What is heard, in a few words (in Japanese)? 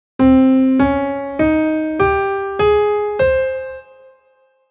ペロッグスケール音源